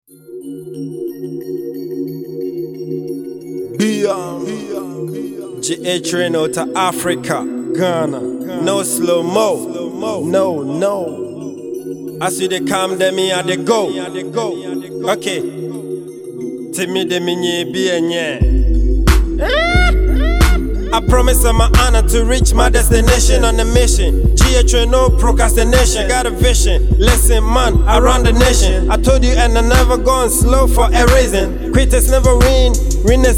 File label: Mixed